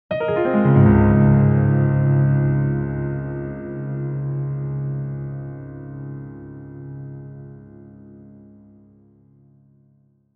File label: Jingle; Bayonetta Origins